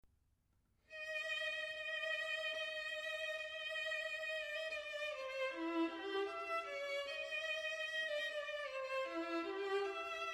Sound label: Waltz